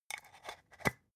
spice jar scratch tonal close slow; industrie wirtschaft; gastronomie

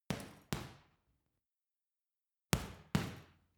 boxing punching bag side hook double medium; sport freizeit; boxen

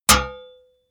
metal impact sword plate

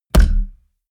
heavy hit damped; whooshes rises; hits impacts

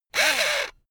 electric drill screw in short; maschinen; bohrer; soundpool

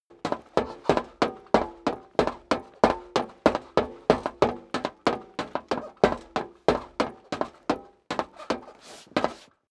step boots metal dry run sequence; schritte

